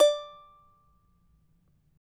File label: charango D4, guitars; Spectrasonics; Hans Zimmer Guitars VOL-2